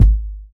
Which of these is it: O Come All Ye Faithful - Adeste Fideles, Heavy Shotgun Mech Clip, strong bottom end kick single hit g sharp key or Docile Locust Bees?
strong bottom end kick single hit g sharp key